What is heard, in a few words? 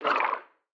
night frogs; factorio